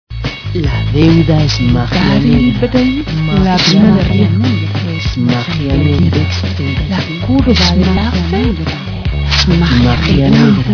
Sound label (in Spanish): mantra corto